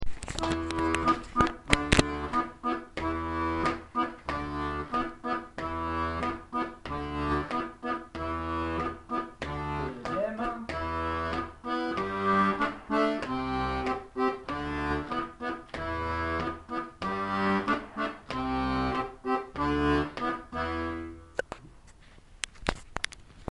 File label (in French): Main Gauche; Ronde; Ronde des Chasseurs; Rondeau 2023